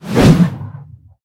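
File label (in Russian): moshchnyi zamakh ogromnym tyazhelym molotom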